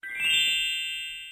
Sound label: answer